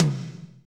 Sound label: tom, fast shuffle, Roland